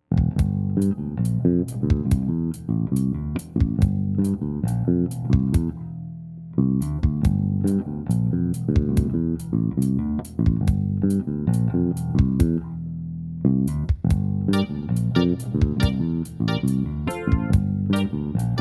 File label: walking groove